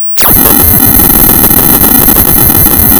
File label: pop, tearing paper